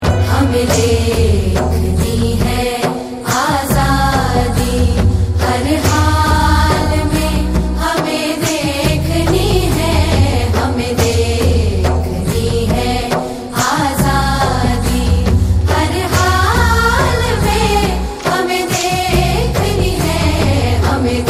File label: Aazadi song